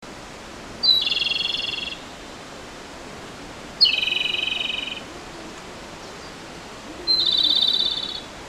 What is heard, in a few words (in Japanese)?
komadori